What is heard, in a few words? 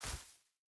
footstep barefoot grass jump land; Rust